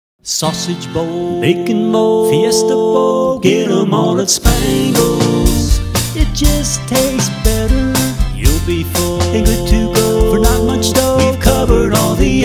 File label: Breakfast Bowl, jingles